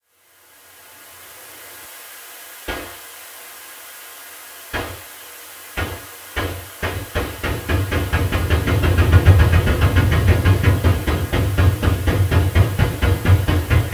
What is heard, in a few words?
Steam Machine Runup